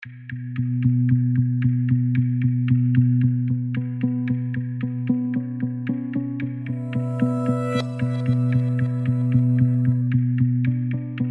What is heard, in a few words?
Mysterious Reverses ohne Klavier